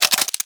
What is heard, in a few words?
Pistol Reload; Warframe; Tenno; Guandao